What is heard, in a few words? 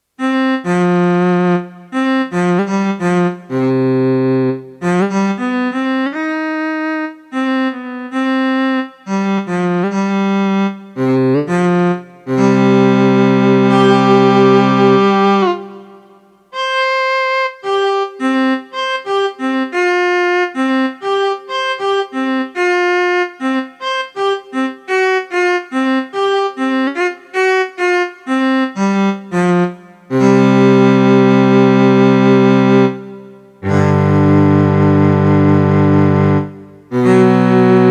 Al Farabi s Chromatic; c700 AD; tuning survey